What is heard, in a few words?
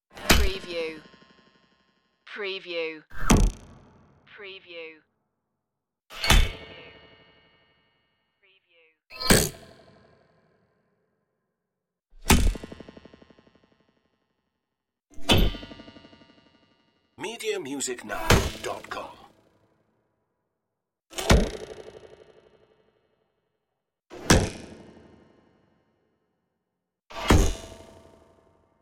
space arrow impacts